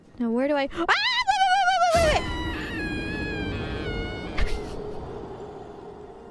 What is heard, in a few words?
falling scream again; IRyScream